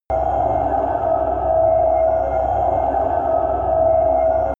underwater noise

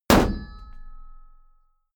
sword hit shield ring

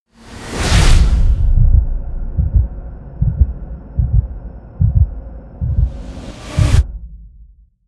promo pad

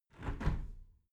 window close soft

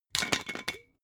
glass bottle massive open fast

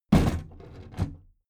big plastic case lid put down regular

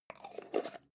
jar plastic midsize close slow

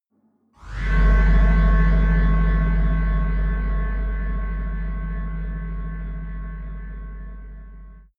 tunnel horror drone

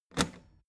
old button heavy hard push

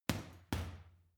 boxing punching bag uppercut double soft

